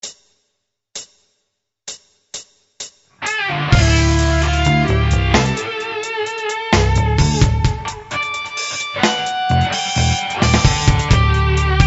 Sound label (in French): Style jazz & fusion; guitare; Free style